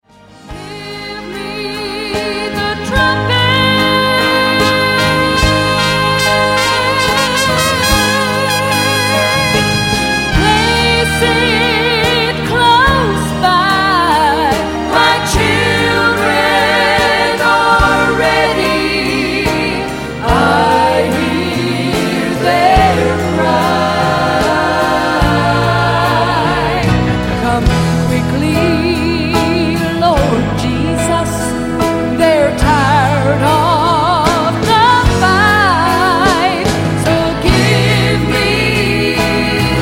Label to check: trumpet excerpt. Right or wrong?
Right